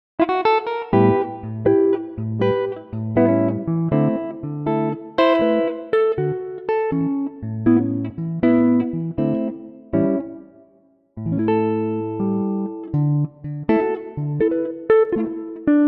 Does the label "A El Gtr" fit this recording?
Yes